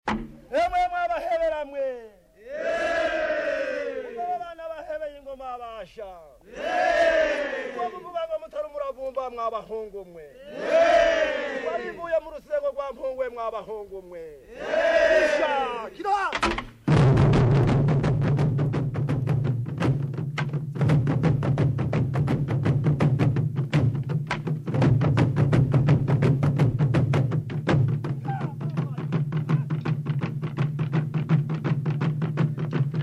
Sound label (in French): tambours royaux, afrique